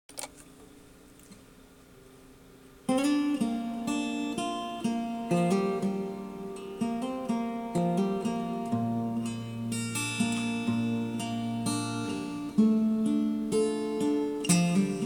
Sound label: hulusi; shifanyuequ